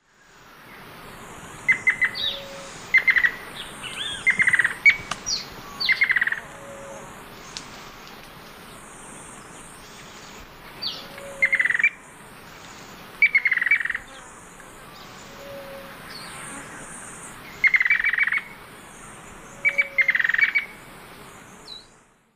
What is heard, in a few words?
Myrmochanes hemileucus, song and duet; S. America; Eastern Lowlands